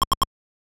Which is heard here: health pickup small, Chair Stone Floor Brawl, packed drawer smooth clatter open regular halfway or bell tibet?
health pickup small